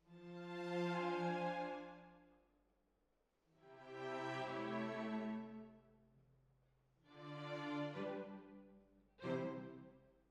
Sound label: Hall, Jazz for piano